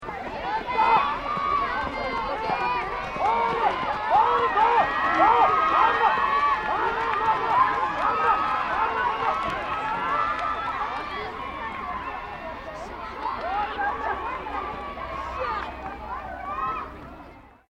Athletics Crowd Children Cheering, School sports day Japan